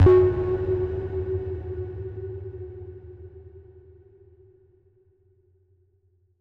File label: Blip FX, F#; House; Various FX